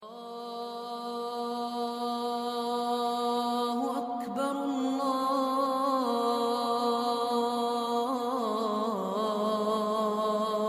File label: call to prayer